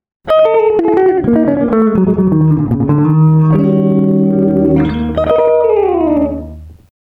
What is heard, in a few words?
delay; lábkapcsoló